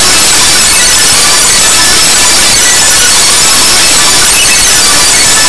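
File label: RHYTHM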